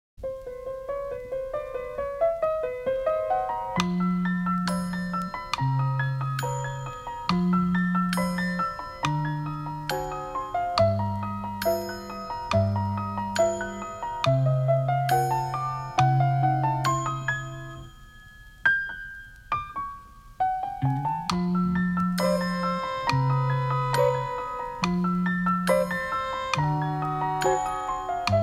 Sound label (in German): Musikeffekte Piano traurig